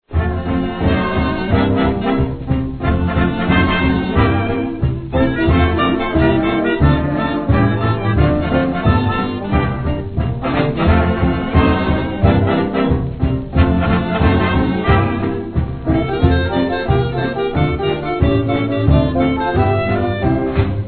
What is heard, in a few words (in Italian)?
Orchestra Jazz